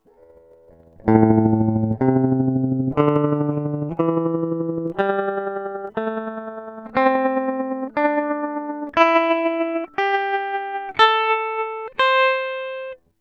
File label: Am pent pos5 desc depth100, A Pitch-Controlled Tremolo Stomp Box